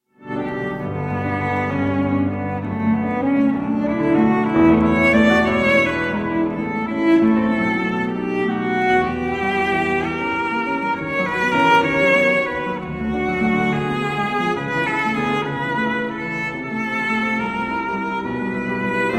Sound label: sonata in g minor op 65 for cello and piano 2nd movement scherzo